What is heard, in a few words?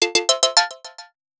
Prone alert, phonetones; Sony Ericsson; PlusFourSix; SE Sound Project; Lars Edvall